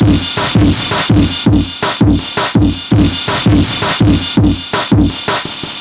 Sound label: Classic Beat; Hip-Hop; Fast Breaks